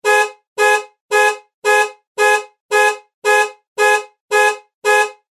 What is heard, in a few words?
Car Alarm